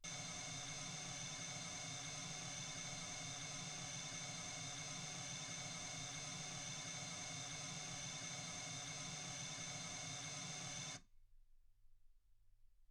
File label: mls 48kHz